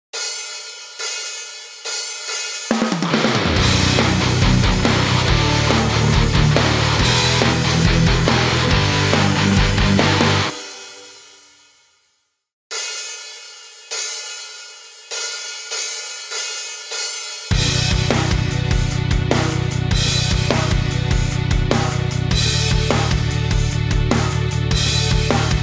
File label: karaoke